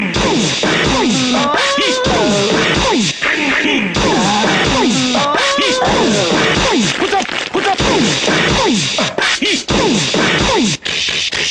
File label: Beatbox; Always Sunny